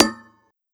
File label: Perc Foley Tap, One Shots, Drums, Misc Percussion